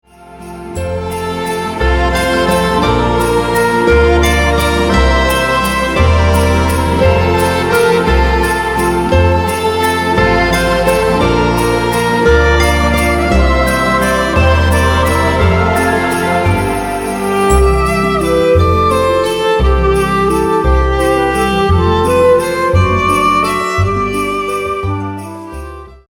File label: Christmas Waltz